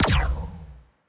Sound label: anti fire rate laser muzzle, weapons; biogenesis